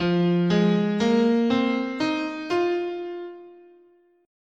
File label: F Minor Pentatonic, scale